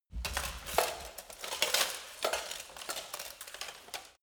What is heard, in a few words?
light get up